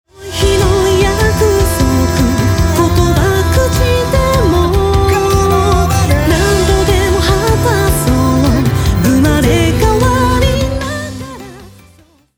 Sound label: vocal bypass